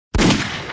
revolver; minetest

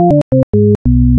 fail; HearingGame

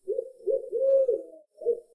owl; minetest; unified inventory

